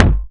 SPEENY KICK

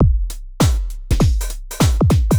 drloop, drumloops, Vinyl Attack; Masterbits

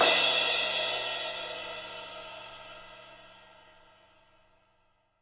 CRASH, percussion reverb; MARKYDRUMS

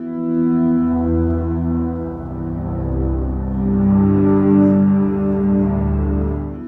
Acoustic Drone, Long Loops; Sonic Foundry (Sony Creative Software) - Friendly Fire; DroneLoop

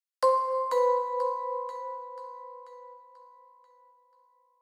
Vibes, 123bpm; Zero-G; Nu Disco; Construction Kits

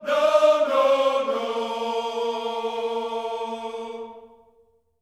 NO-NO, Gospel; Spectrasonics; Vocal Planet; GOSP PHR

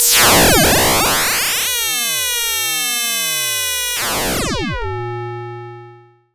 SYNTH FX

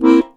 HOHNER, akkordeon

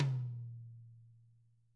DW hit, Drums; ILIO

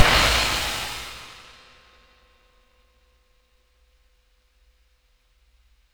RAIN RIDE CRASHED; DRUM N BASS:JUNGLE BEATS; DRUM N BASS KIT 2

